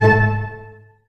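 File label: Staccato D# PP, Orchestra; ORCH STAC.-M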